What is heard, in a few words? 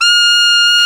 SAX A. MF F, Alto Short; Roland LCDP07 Super Sax